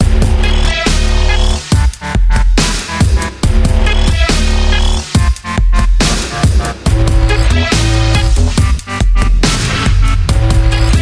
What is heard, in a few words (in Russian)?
muzyka dlya igr